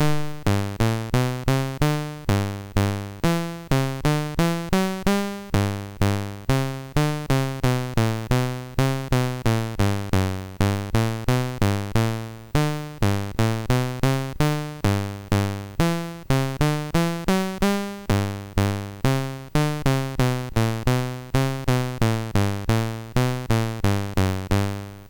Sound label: Minuet, MOS6581; MOS6581R3; Bach